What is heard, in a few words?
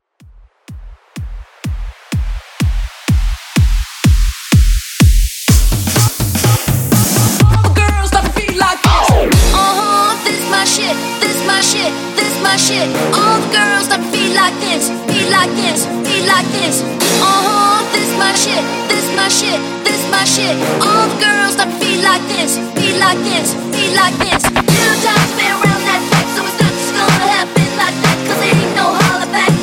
House Redrum 125Bpm